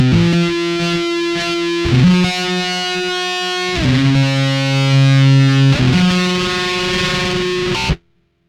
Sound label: Distorted Guitar loop